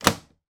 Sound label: household washing machine detergent tray close; sound effects one